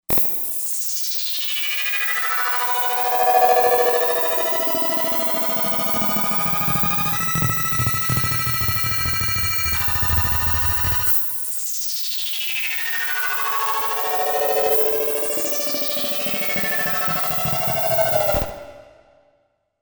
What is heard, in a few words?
Soda Straw